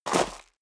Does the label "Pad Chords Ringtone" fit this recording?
No